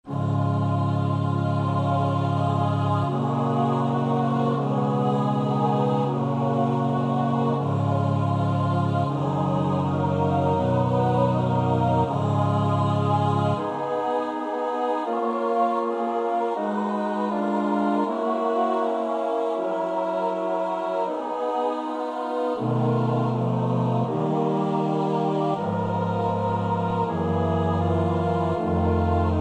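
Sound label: soprano alto tenor bass; Kodály (Zoltán); Pange lingua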